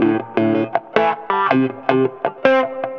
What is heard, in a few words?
Wah Wah Gtr